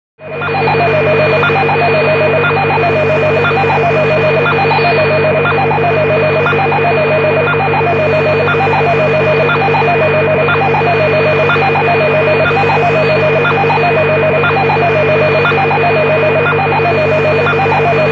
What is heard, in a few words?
Radio Static Constan, static